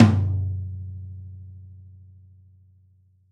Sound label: Folk Tom